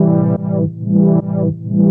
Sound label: house synth 125 A, keys